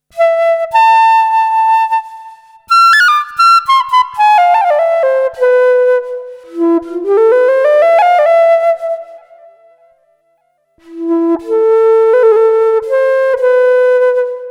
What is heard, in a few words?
flute